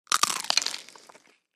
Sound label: Chicken Crispy Bite